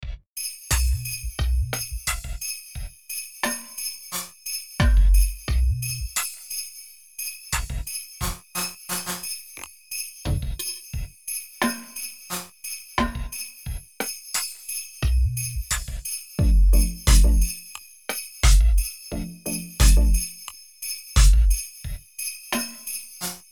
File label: drums